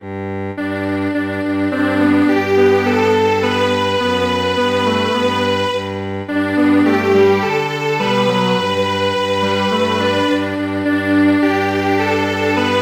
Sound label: Strings Quartet